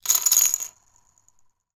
foley chips tokens plastic x10 down on table

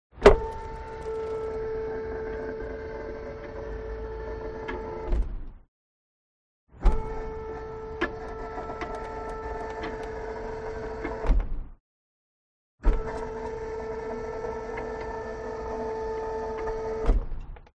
GARAGE Car Lift Up; CONSTRUCTION; smartsound

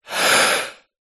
male inhale deep breath; human; zapsplat

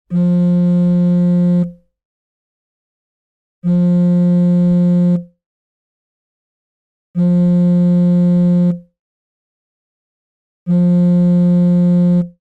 technology cell phone vibrate in hand; sound effects one

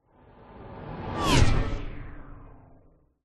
sound design whoosh sci fi pass hit; zapsplat

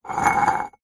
cup mug scrape drag concrete; household; zapsplat

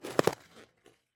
ICE Skate push and propel

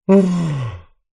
fantasy giant male sigh

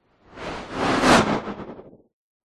sound design whoosh white noise stuttering fast

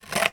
office scissors cut cardboard single; sound effects one

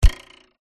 cartoon arrow hit target

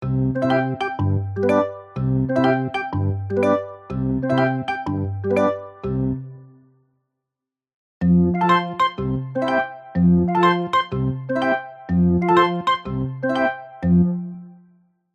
cartoon walking care free happy musical

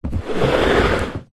tub plastic roll slide carpet; foley

